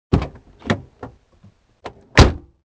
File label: truck door; Soldier Of Fortune II Double Helix; XBOX